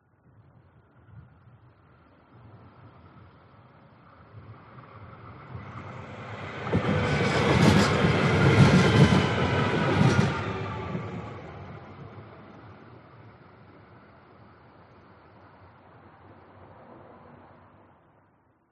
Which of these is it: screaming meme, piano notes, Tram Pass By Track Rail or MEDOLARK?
Tram Pass By Track Rail